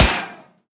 metal sheet, bullet impacts; physics; Half Life Model Pack

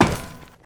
ladder, footsteps

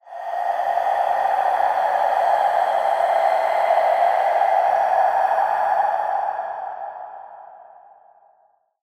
ambient skull breath; ambient air; Half Life Alyx

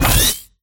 stunner activate; scripted sequences; kidnap; eli

